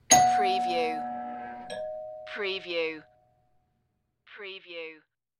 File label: Door Bell